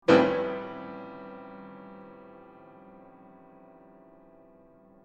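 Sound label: piano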